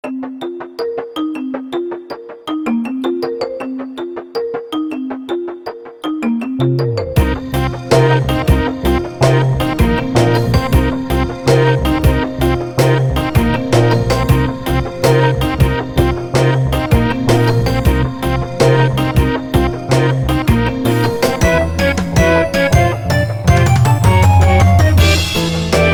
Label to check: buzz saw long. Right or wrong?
Wrong